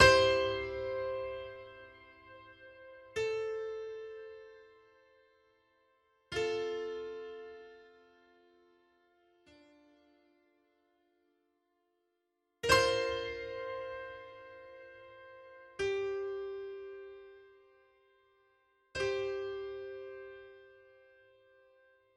basses in melody

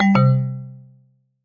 Hardware Disconnect

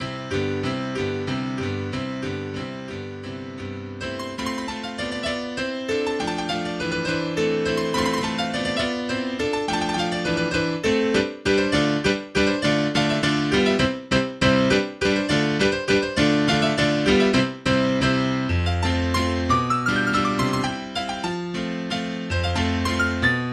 Mazurka in C Major Allegro non troppo

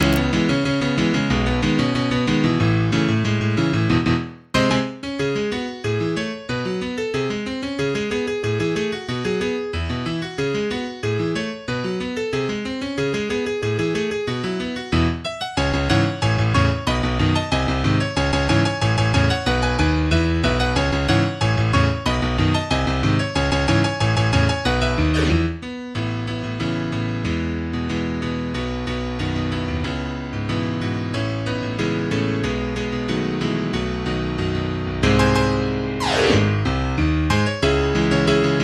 piano

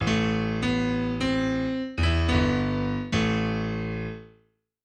Death

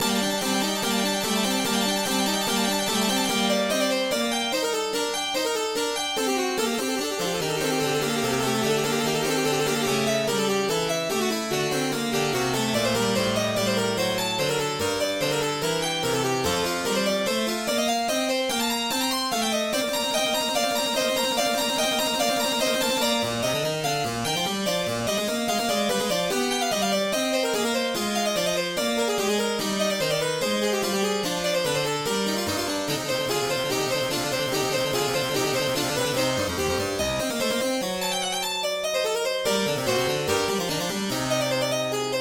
in G Minor; BWV 861

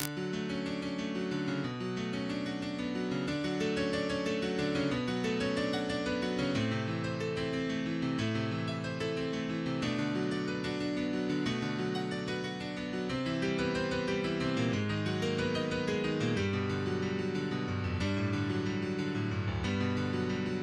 Piano Improvisation No 1; Spellbound

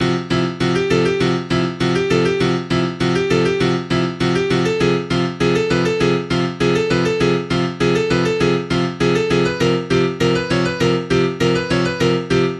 piano

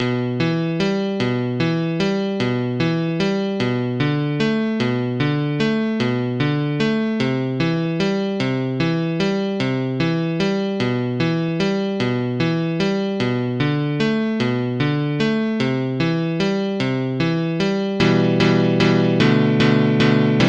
piano

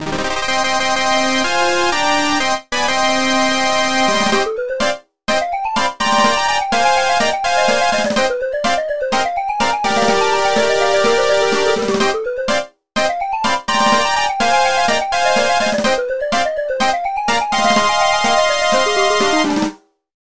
Tango

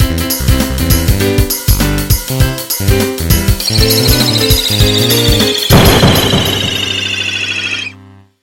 Game Over; Super Mario CD Japan Europe

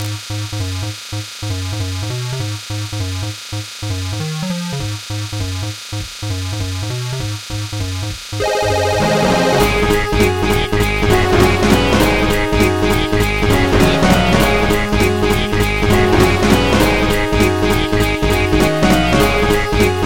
Waterfall Rumble

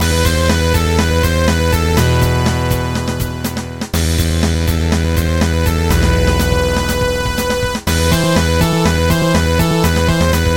Techno Remix; Island Map

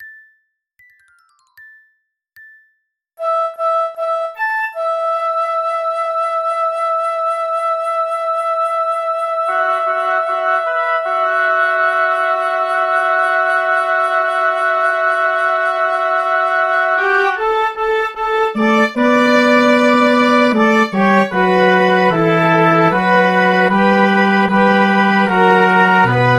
Fanfare

